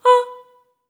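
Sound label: staccato B4, soprano, Voices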